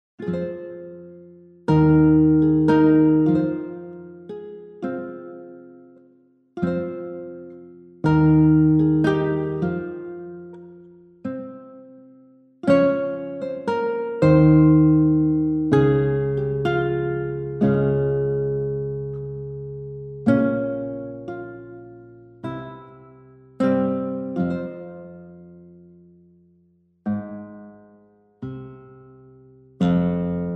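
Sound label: nylon live test with processing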